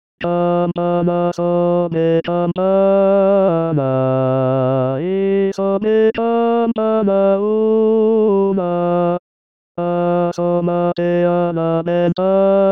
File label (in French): Tenor; Bélen Campanas de Bélen - 2 voix